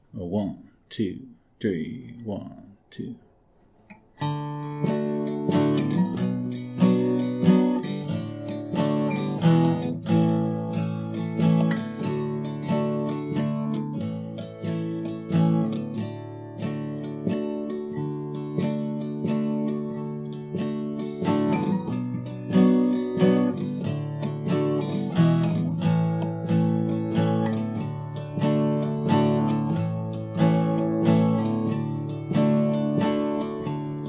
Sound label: star spangled banner backing track d major